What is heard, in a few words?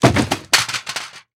Impact Plastic Radio Hits Wooden Floor With Falling CD Case